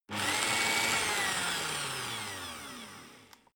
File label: electric whisk rev rear; haus-garten; kueche